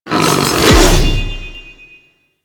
Wolf Throw Attack; Warframe; Grineer

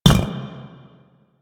Footstep; Warframe; Warframes; Qorvex

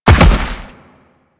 Land Heavy Dirt, Footsteps; Enemies; Warframe; Teshin; DE

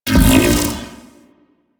Orbital Strike Cannon Start; Ambience; Warframe; Corpus Ship Remaster

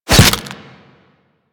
Desert Skate Barb Hit Default D; Warframe; Attacks; Hit Effects

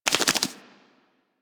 foley idle head shake; Enemies; Warframe; Catbrow

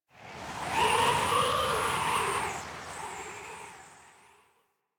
Wind Gust Large, Ambience Plains; Warframe; Eidolon Plains; One Offs

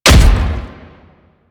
Thumper Bot Step Heavy B; Warframe; Grineer

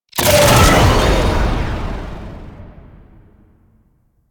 Distraction Drones Cast; DE; Warframe; Weapons; Archwing; Powers

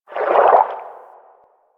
Fish Tank Movement Quick; Warframe; Liset; Hub; Personal Quarters

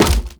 Hammer Impact Wood; Weapon Impacts; Player; Environment

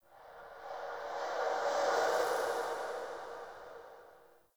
Fey Eldveil Latent Random, Powers

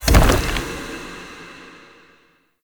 Chest Stone Coffin Open Complete; Objects

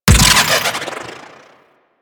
Projectile Launch; Redline; Warframe; Runner

